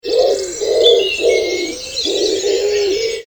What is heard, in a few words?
Woodpigeon